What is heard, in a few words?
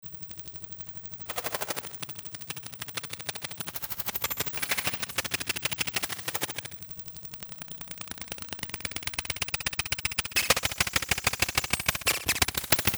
Fluttering; ciat-lonbarde; fyrall